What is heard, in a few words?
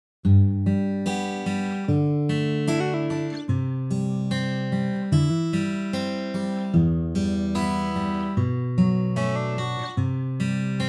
guitar